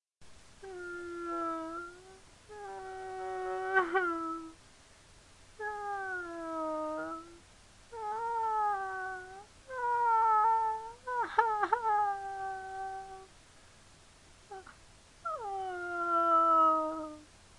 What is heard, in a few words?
woman sad moan